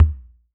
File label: Kick, drum